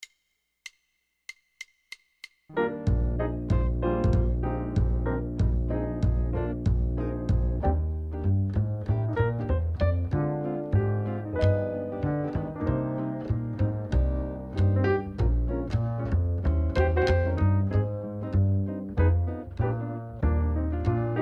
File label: Jazz DRUMS Play Along Up Tempo Swing No Drums; LMEA All-State Jazz